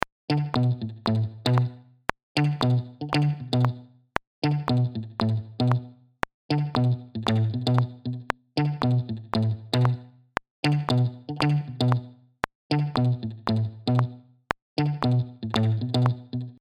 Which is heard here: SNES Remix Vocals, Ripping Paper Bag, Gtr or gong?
Gtr